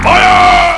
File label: gallitgun